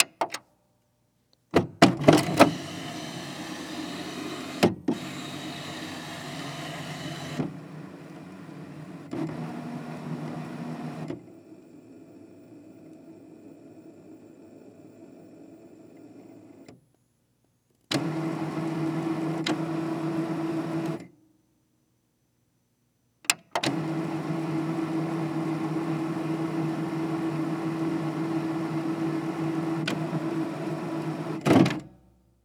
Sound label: Electronic Printer Turned On And Off; AdobeCC